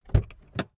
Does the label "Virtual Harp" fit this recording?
No